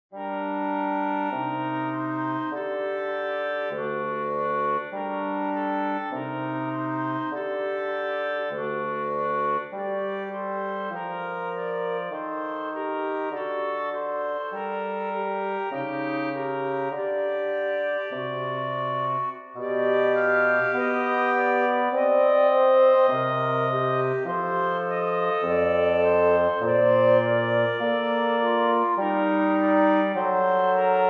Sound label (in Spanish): Via Crucis Estacion Capilla Instrumental